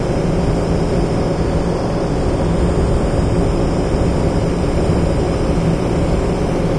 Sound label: station ambience stereo loop; source engine; hl2; video games